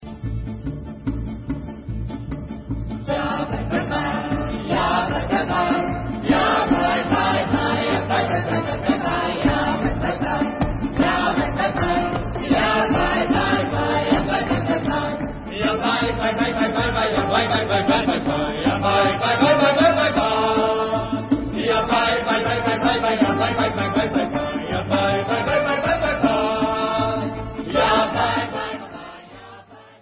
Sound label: Chassidic Melodies VOCAL